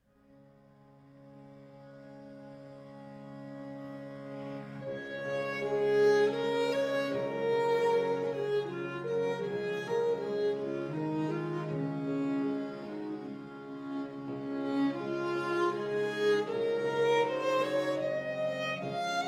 Sonata in D Major from Trio Sonatas on Scots Tunes; Cromlit's Lilt; J Oswald